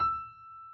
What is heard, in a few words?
key